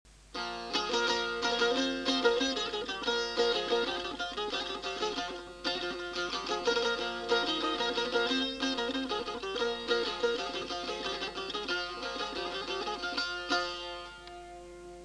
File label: saz